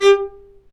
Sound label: Low Marcato; Roland L-CD702; Combos 1